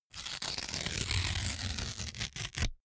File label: notepad sheet tear up